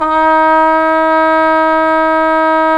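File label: brass cup mute trumpet, dry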